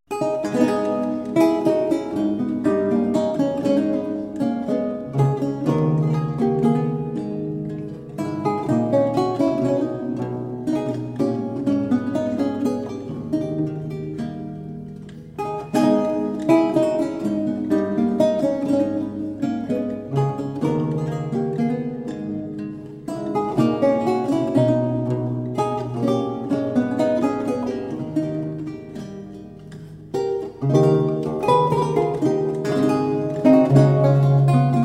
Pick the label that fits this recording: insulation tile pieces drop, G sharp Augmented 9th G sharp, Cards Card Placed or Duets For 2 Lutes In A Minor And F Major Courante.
Duets For 2 Lutes In A Minor And F Major Courante